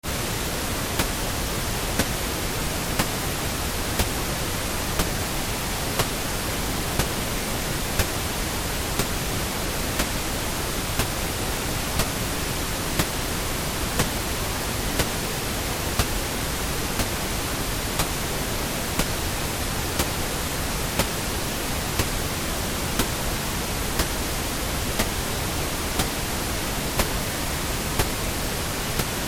impulsivo 12db menos del 10 100; Penalización por impulsividad y tonalidad

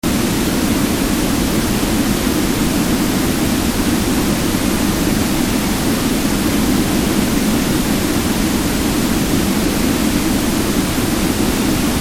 centrada en 250; Penalización por impulsividad y tonalidad

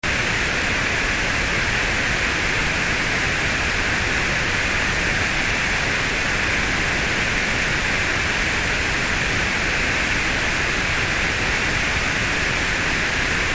centrada en 2000; Penalización por impulsividad y tonalidad

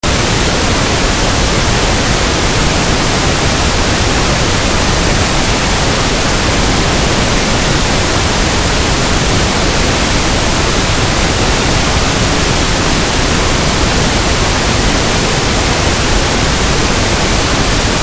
Ruido Rosa centrado; maximas diferencias entre normas

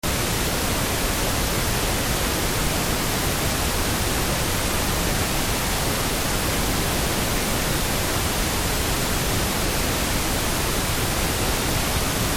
impulsivo original, Escuchar penalizado.+3 dBA; Penalización por impulsividad y tonalidad

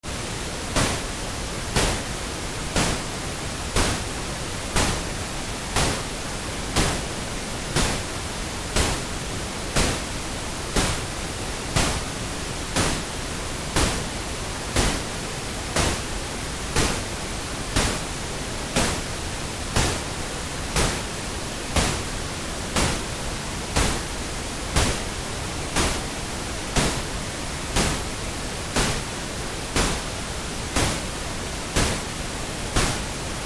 impulsivo 12db; mas del 10 100

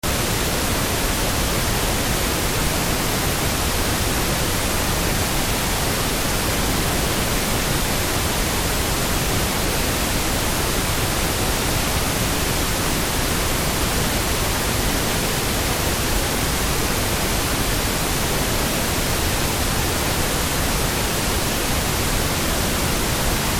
impulsivo original, Escuchar penalizado.+5 dBA; Penalización por impulsividad y tonalidad

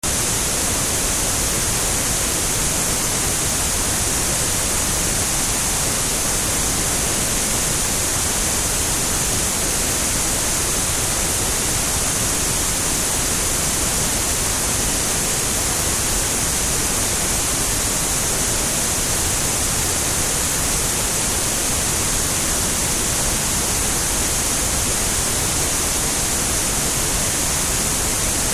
centrada en 8000

